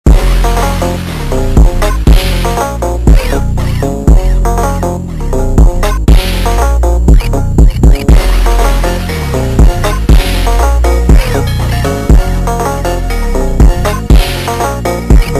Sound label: baixo slowed reverb